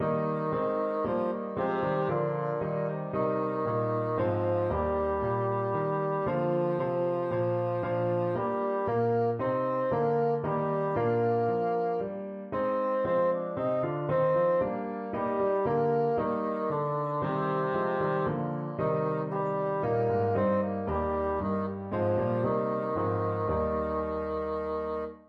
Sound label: bassoon